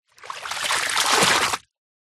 nature water pour from plastic bucket into deep water; zapsplat